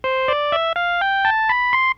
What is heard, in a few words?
Nord Lead 4 square